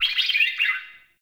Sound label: Ext Close Bird, Ambience